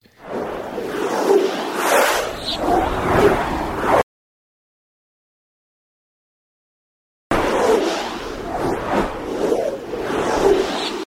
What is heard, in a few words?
Swoosh